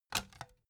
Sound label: cd take out tonal